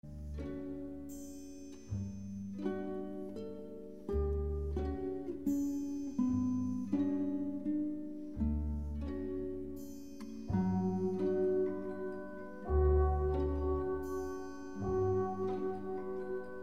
Gymnopedies, Lent et Douloureux, For Guitar and orchestra; Arr. C. Debussy